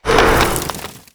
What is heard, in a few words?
Flame Thrower Skin, Damage